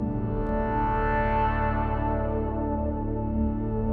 122 BPM Pads Amaj Fmin Tonic A, ambient synth pads; MMB